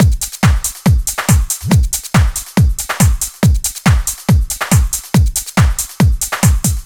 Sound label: 140 House Beat; Dance DJ; Drums; House Beats; Pro Tools Essential Loops; Digidesign